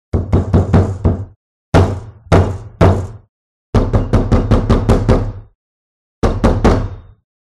po bolshoj derevjannoj dveri; Звук стука в дверь

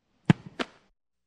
udar po mjachu i zvuk popadanija v setku

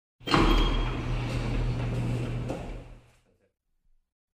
Bolshaia dver otkryvanie

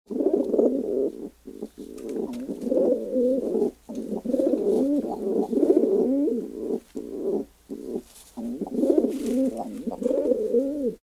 golubi vorkujut v kletke

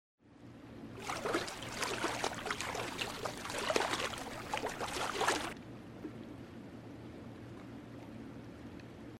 v pustom basseyhe poboltali nogoy

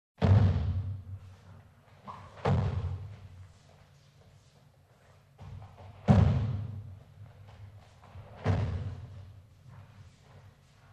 zvuki padenij sportsmenov v sport zale; Звуки падения человека

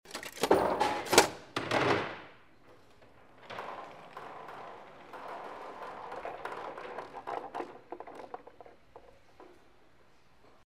pul shar katitsa

